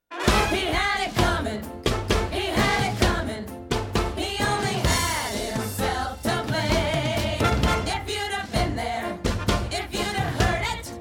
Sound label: unison